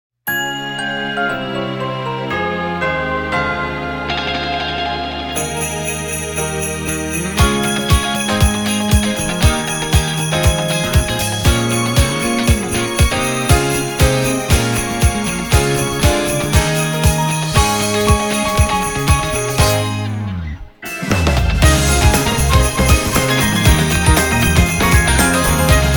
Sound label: inst